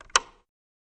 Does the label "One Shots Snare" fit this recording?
No